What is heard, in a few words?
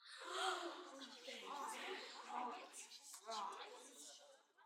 Crowd Suprised Murmur